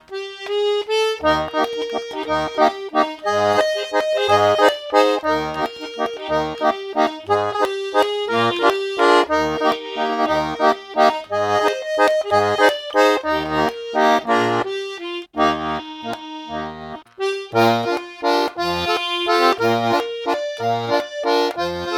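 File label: PRECIOSA Bb Eb 440 cercle Circassien, accordion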